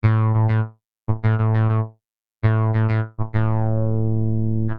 Classic Funk Synth robot

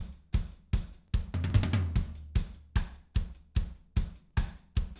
music 16kHz

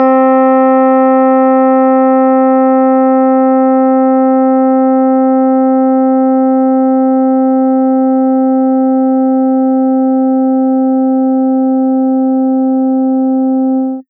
elec piano; sonic-visualiser

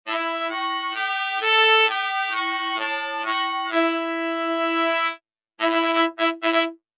RnB Horn Section robot

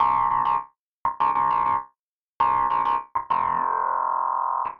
Classic Funk Synth ringmod

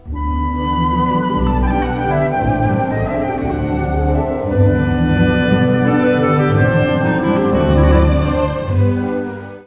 valse triste happy; MIRtoolbox1.3.2; MIRToolboxDemos

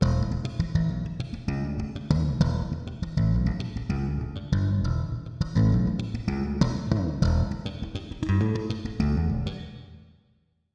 Electric Slap reverb

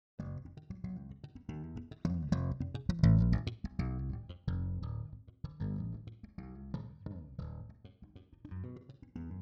Electric Slap doppler

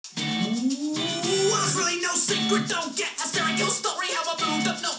music 16kHz

